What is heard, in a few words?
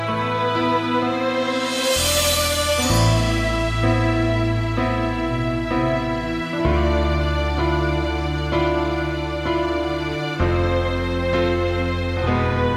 loves sad lament accompaniment, ocarina